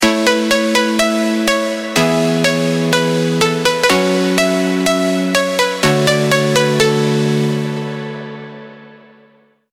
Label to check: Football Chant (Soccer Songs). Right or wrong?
Wrong